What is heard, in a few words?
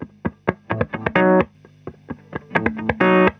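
PIN guitar1, 130 bpm; USB Soundscan vol.32; Roots Disco; AKAI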